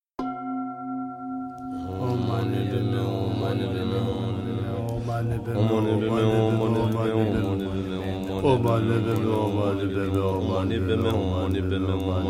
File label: Om Mani Padme Hung